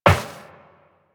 Step Metal Perforated, Footsteps, Walk; Warframe; Tenno

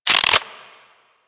Revolver Pistol Reload Chamber Fast

